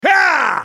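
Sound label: spellcast effort; ZhaoYunXinZhao; Character Voices - English; en US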